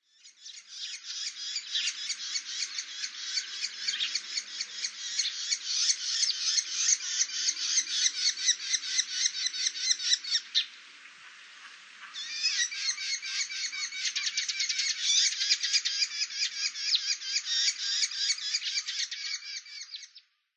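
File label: Bull-headed Shrike; mozu; watarase; koe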